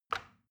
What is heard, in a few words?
plastic button tiny release regular